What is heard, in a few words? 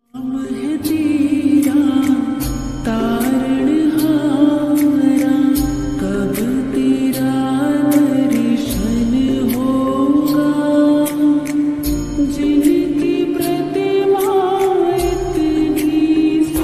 Lofi Slowed Reverb Ringtone